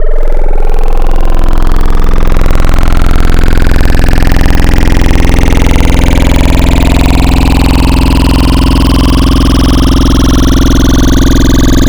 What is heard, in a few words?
Sine Patrials, Wavetables; Cymatics; Wavetables Collection Vol 3; Serum